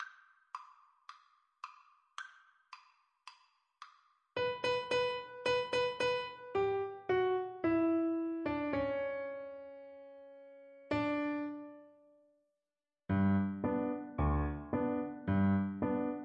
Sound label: radetsky march, flute